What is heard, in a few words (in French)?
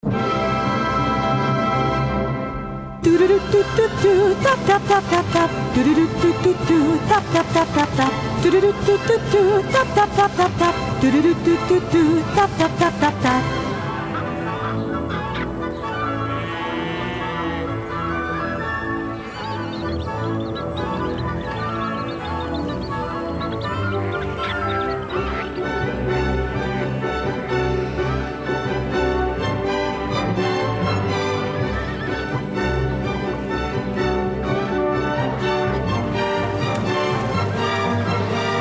La danse slave vocale